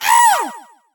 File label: Cheerleader pain; Fight Knight